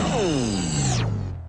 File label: electro immm, Robotic or Stuttery or Spooky